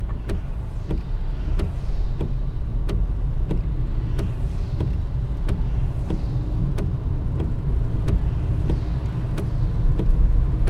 Windscreen Wipers

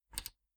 Button Click

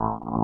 Wave buzz

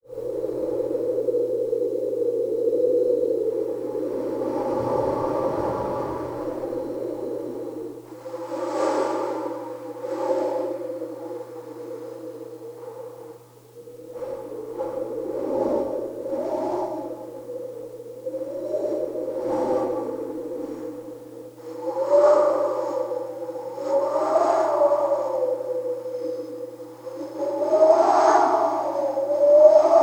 Wind Oral Simulation

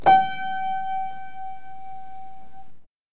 Piano

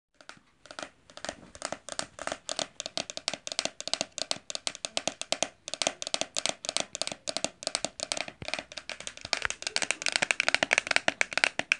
Baby Horse Running

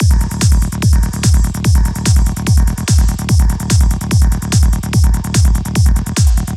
Psy Beat; Drpsy

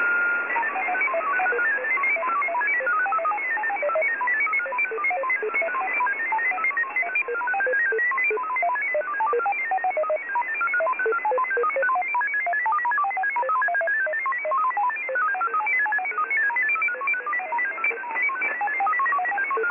Frequency Modulation Coding

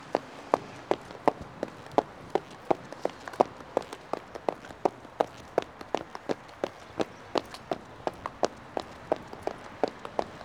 Feet Speed

